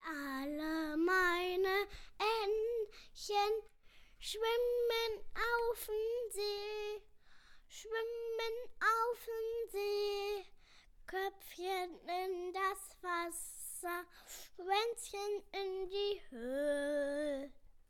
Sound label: Childrens Song